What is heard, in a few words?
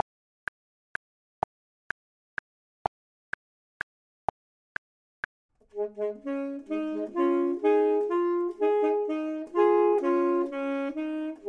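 both, Saxophone and Clarinet; voxman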